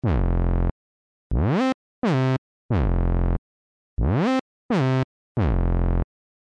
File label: pro one lead, creamware